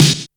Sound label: Snare, Boss DR-660, Drum Machines; 90 s Sample CDs; 300 Drum Machines